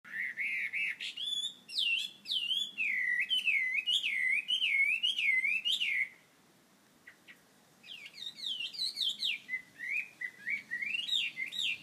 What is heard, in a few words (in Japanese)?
ガビチョウの鳴き声